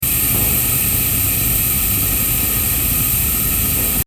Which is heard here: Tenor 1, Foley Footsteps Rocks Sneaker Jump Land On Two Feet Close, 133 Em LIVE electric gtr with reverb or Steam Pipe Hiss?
Steam Pipe Hiss